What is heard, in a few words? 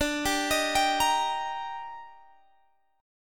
D sharp Major D, chord; D#/D arpeggiated